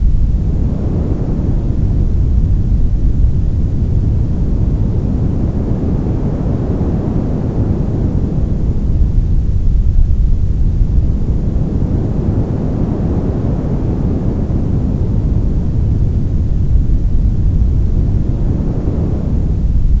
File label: wind cave; XDM; ambience